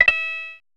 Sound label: Coin